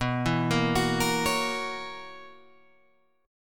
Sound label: B Major 7th Suspended 2nd Suspended 4th B Guitar Open G